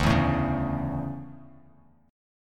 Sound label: D Major 7th Suspended 2nd, Bass Standard; strummed; JGuitar